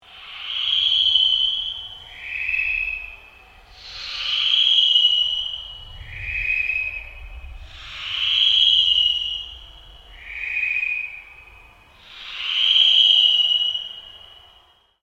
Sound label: Ambient Drone Slow Sweep; Sound effects created by manipulating other sounds; Sound Design